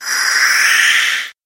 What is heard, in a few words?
monster tormented souls